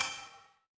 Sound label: impact metal hit